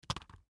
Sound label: Household Back Massager Drop; Blastwave